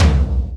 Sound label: tom; drums; virtual drum sounds